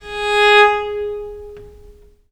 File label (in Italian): G#3, Historical Instruments, VIOLE MUT; USB Soundscan vol.23; AKAI